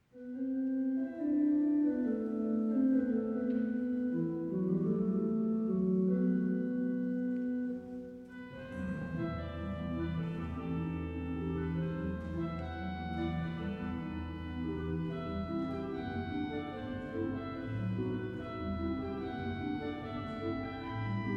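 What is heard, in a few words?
Jongen Improvisation Caprice op. 372, organ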